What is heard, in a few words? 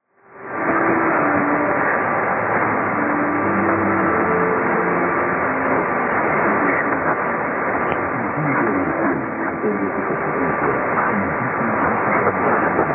6155.3kHz Radio; ボリビア; Radio Fides